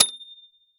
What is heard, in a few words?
rocks hit by large metal pole; a dump in the dark